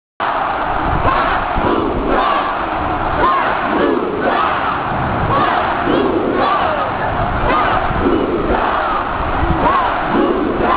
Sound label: chant; katmuda